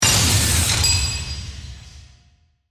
Hit Mechanical, Movement, Industrial; Production Element, Imaging Element, Accent, Transition; BlastWave

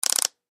Button Switch Gear Multimedia; Web Interactive; Technology; Blastwave

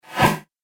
Warlock Magic, magic; Magic Sound Effects; Role Playing Game; Andrew Fleming

